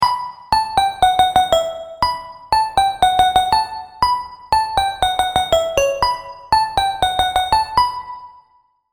vital presets; chiqui audio; vital plucks presets

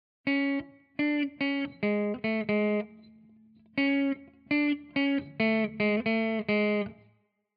les paul wet; chiqui audio

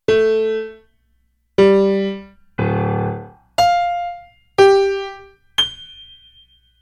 piano

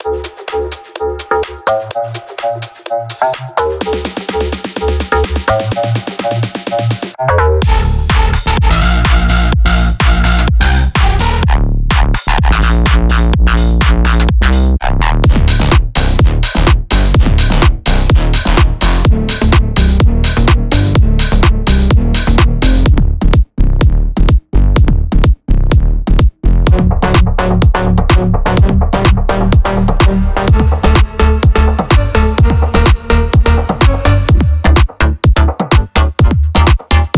house donks; xlnt; ultra audio

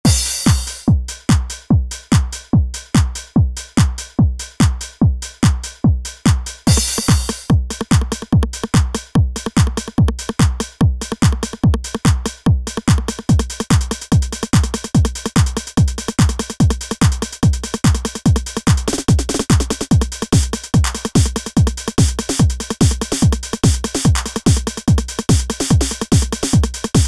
d909 kit; superwave

